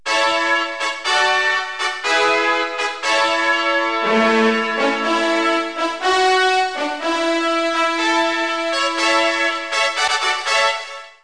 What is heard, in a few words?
Fanfare Full Mix; KABOOM! - Wild and Wacky Sound Software; Music